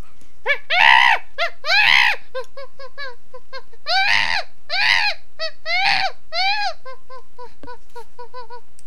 noise monkey; Bionicle; rahi

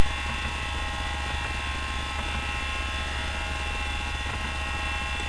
laptop noise; Brickfilms; TechProblems

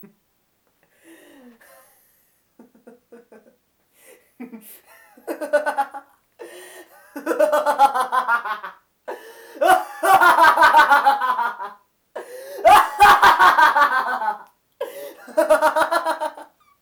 laugh

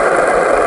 wheels

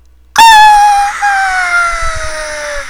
scream

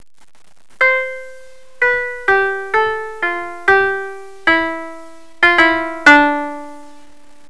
koto

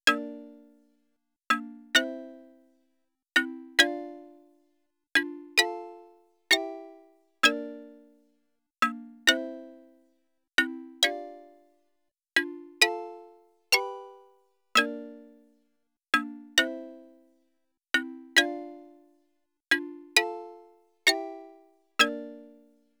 xylo; nextgen

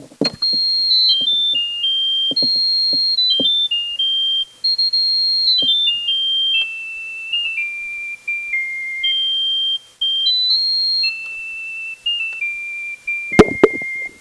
bionicle music sped up

